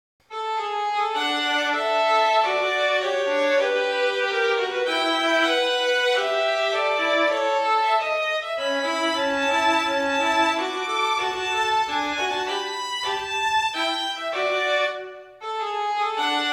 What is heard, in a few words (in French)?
Valse pour deux Violons; Le desir; de Beethoven